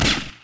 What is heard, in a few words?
Ammo; pistolety srednie; bron reczna